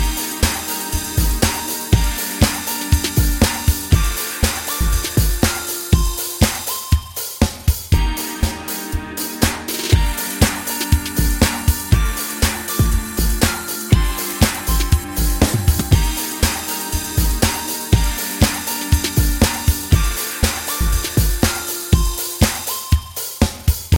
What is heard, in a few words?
happy end